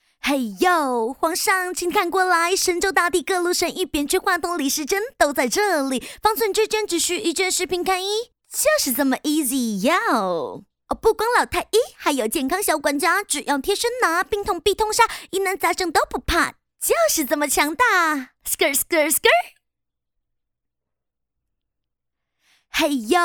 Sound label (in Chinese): 女 翻唱说唱 中文Rap